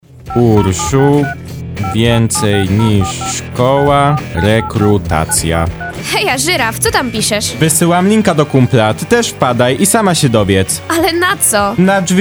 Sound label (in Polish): Rock Tlo Szkola Dzwonek; Urszulanki